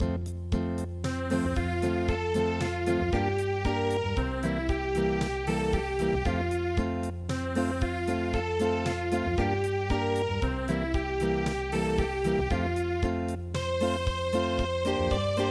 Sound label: Unplugged; Hab mein Wage vollgelade